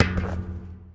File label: Street Ball Metal Basket; SFX Kit; Street Basketball